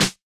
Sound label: close miked snares; nova sound